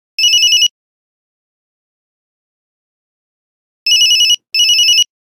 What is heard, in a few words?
Nokia Buzzer; Windows Phone apps